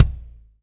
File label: Fatty Kick; EMU Orbit-3